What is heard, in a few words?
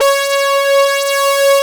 SYN ANALE; Sound & Vision - Gigapack I CD 2; JD 800/990